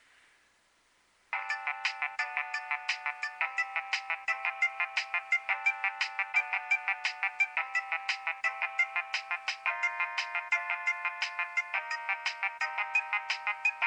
nokia3100 ringtone

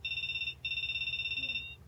nokia 2610 ringtone strain

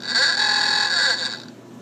old car horn ringtone; apple iphone 3g 4

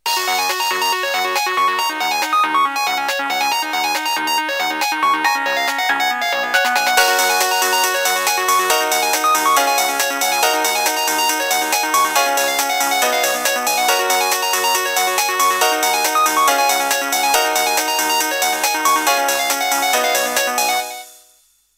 nokia 1208 swimming ringtone

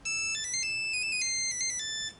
nokia 3210 ringtone; orient

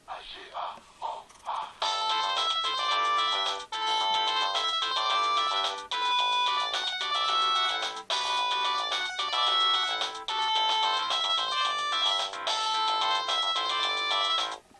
samsung sghe700 ringtone

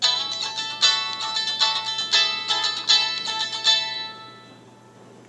strum ringtone; apple iphone 3g 4

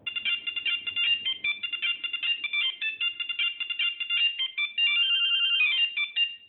nokia 1100 william tell ringtone

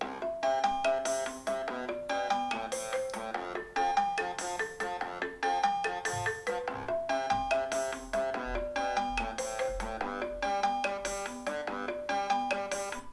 ringtone; playtime; apple iphone 5

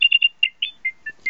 nokia3510i tingle ringtone

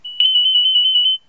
nokia3510i mosquito ringtone

